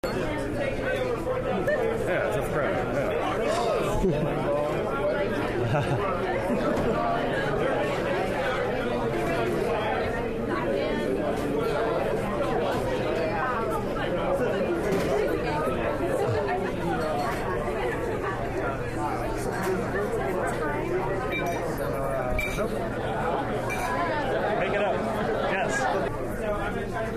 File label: chatter; pdx